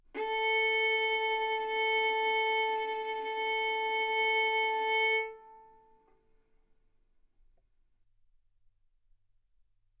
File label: Violin arco A4 B4